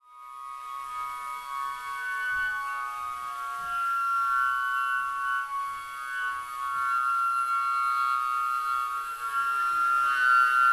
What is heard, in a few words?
Lowest tone